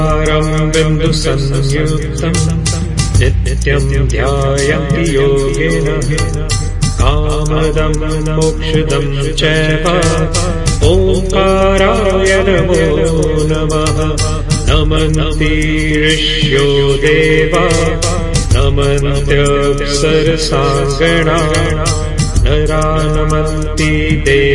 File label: Shiva sadakshar mantra ringtone